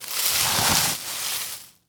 Foley Movement Stick Poking Pile Of Leaves And Dirt